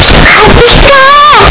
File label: pikachu cry; pokeyellow